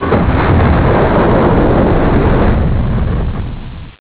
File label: explode